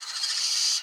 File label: Amb Env Bird-Skreech